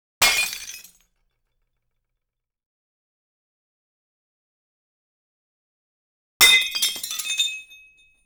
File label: glass small, foley effects; Asian Show Sound Effects